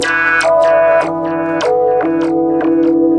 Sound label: 150 echosynth; SYNTH AND ELECTRONIC LOOPS